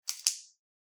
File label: pen click; soundscrate